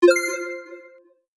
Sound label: tick appear; Business skieme Beta 1